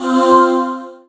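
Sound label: Battle Skill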